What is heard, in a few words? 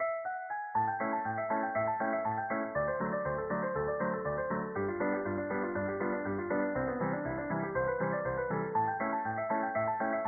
Fiddle